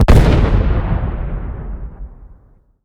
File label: IED Explosion Distant